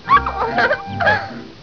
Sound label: giggle